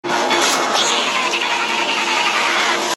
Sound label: Battle cry; NanoZIM